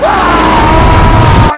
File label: charge windup; tf